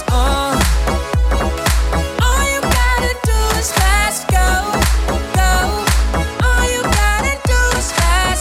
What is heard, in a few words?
funk; multitrax